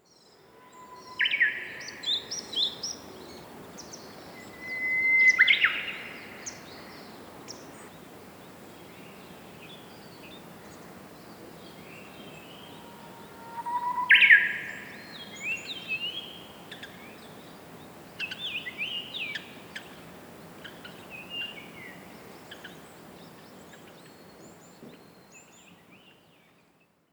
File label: bird